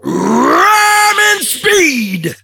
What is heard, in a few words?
attack